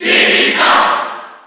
Cheer; Diddy Kong; SSB4

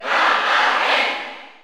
Cheer German; Robin; SSBU

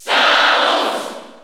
Cheer Italian; Samus; SSBU

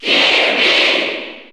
Cheer Italian; Kirby; SSB4; SSBU

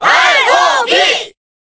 Cheer Korean; R.O.B.; SSBB

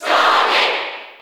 Cheer English; Sonic; SSB4; SSBU

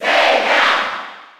Cheer German; Zelda; SSB4

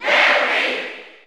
Cheer German; SSBU

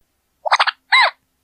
Wiimote Sound; Jigglypuff

